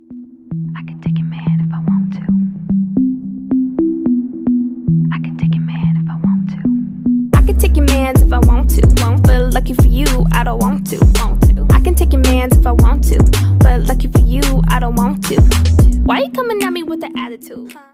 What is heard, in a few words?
English Ringtone